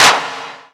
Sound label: Claps; Vengeance essential club sounds-3